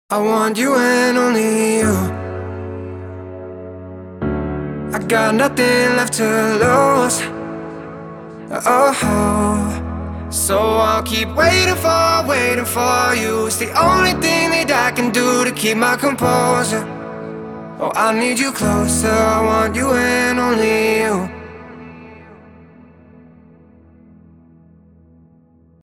main alter boy manipulator piano

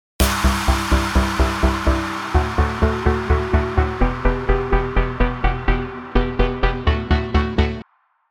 Impacts low cut chords high cut

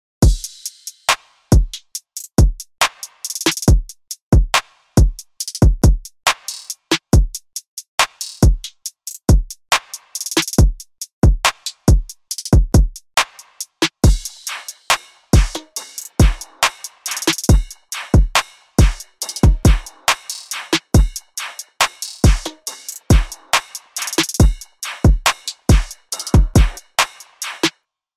Beats

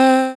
Vocal Shot C Dry

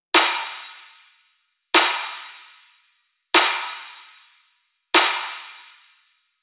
Snare B EQ Rev

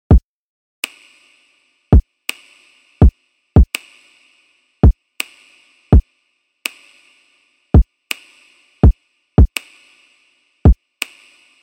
Kick Snap

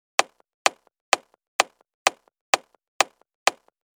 High Cut Kick

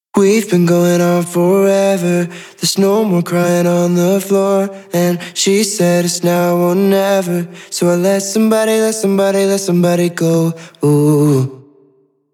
slap delay reverb

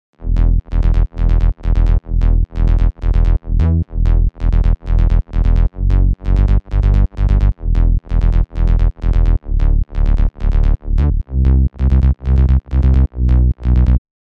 basses

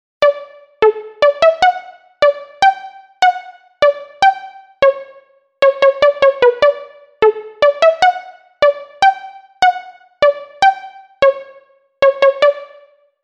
Pluck Room

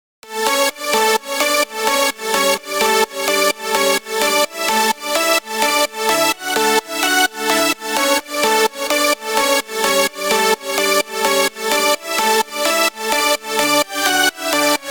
Lead

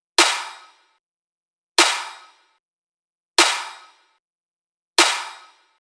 Snare B EQ

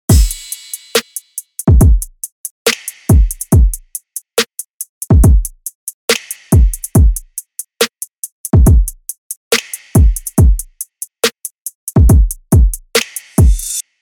Drums

